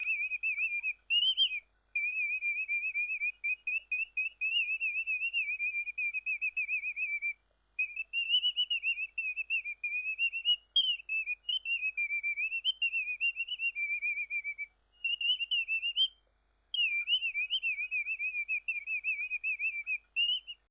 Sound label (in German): zwitschern; eschle